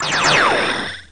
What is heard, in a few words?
sfx level start; The Powerpuff Girls - Trail Blazer; NextlevelGames